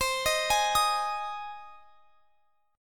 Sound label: C Minor Sharp 5th C down; Cm#5 arpeggiated